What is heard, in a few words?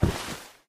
Feather Crack; doodle jump 2; android